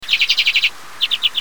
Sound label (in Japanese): benihiwa